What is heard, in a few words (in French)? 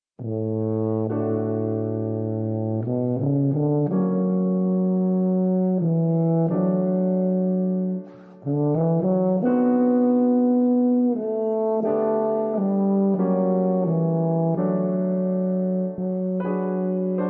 Melodie